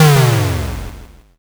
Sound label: explosion m